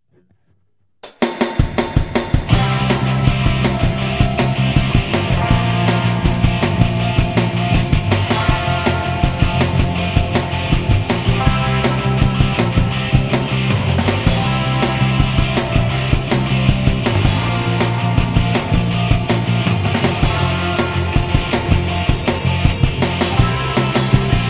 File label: String Glider